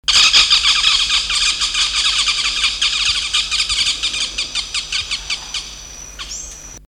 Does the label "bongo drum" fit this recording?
No